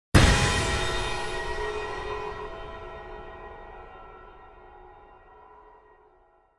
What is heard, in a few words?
slice hit